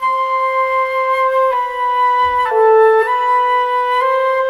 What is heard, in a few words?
Cinematic Winds; Sonic Foundry (Sony Creative Software); Orchestral Series VOL-3; Cinematic 22